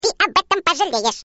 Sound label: grr